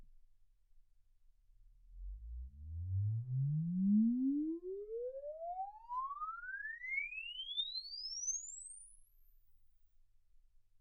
exp swept sinus 10Hz 24kHz

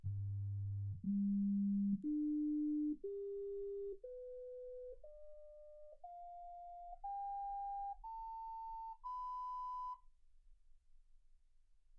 sinus tones 48kHz

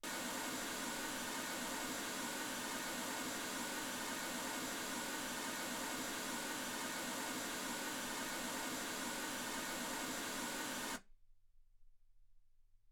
mls16 48kHz

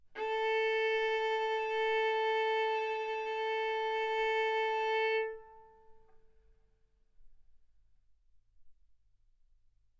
Violin arco mf sul A A4 B4 stereo

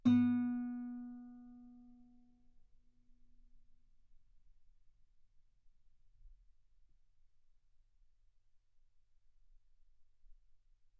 Guitar B3

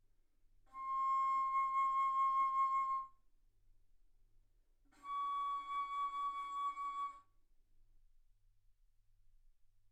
Bass Flute ff C6 Db6

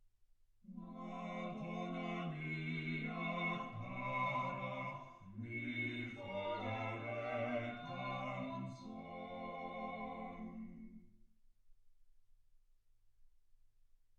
quartet

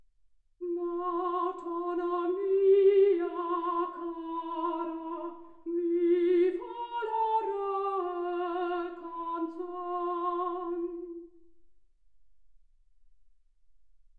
soprano

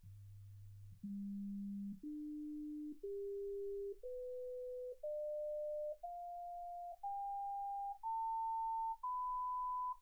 sinus tones 48kHz

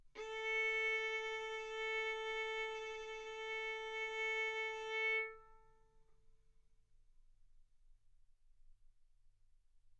Violin arco mf sul A A4 B4 stereo

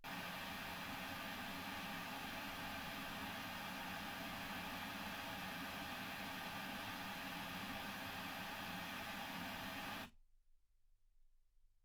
pink noise 48kHz

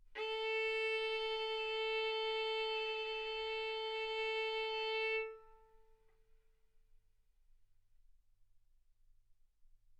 Violin arco A4 B4

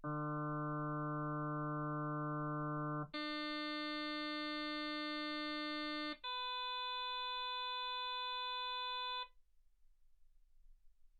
harm sinus 48kHz

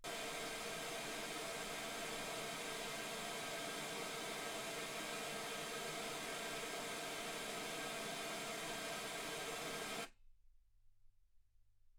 wgn

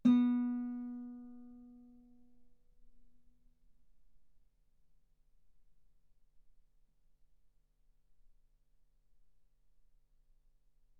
Guitar ff sul B B3

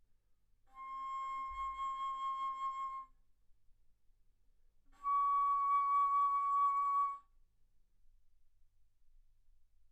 Bass Flute ff C6 Db6

